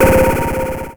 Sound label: Perc, Nord Modular Drum Samples; Yawgmoth